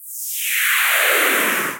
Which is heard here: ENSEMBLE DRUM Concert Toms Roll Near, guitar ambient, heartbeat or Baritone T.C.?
heartbeat